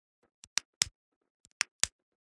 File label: MUGLIGHT swicth